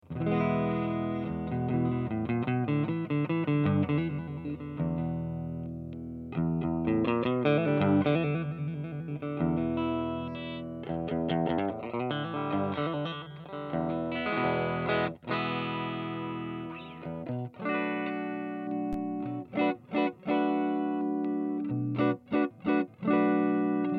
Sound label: strat raw, amps; Volume at 9 o'clock; gypsy